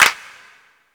Hand Clap Sound C# Key, Royality free clap single shot tuned to the C# note. Loudest frequency: 2817H; single hits one shots